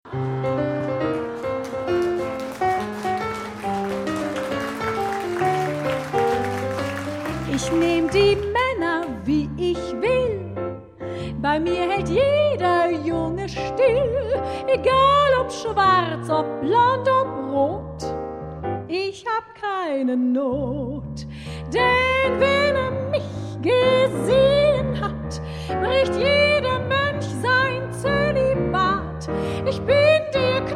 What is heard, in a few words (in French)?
Chanson